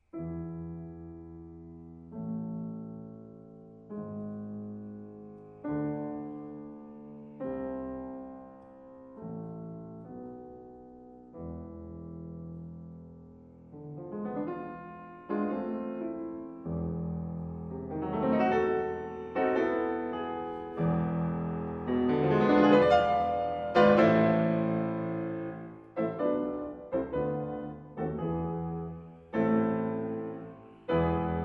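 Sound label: piano quartet; kiel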